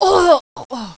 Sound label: shot, female